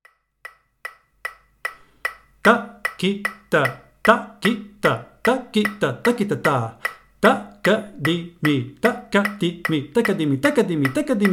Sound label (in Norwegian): Udelt takt, Konnakol